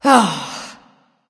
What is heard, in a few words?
nv sigh; smite; awilix